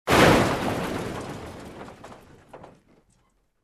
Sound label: Crash or Collision Sound